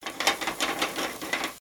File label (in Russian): zvukovie effekti prigotovleniya pishi na skovorode